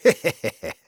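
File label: laugh short, German; Kurzes lachen 4; Spy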